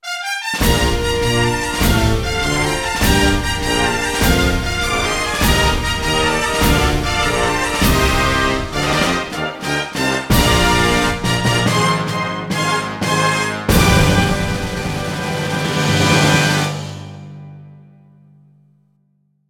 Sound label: Fanfare; Champion Jockey G1 Jockey Gallop Racer; Wii; Fanfare Triomphe